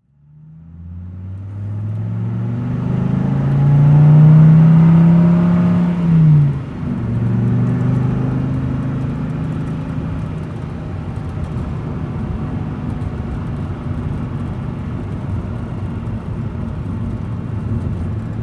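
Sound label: Driving At 45 Mph Slow, sports car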